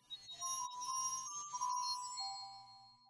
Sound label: Glockenspiel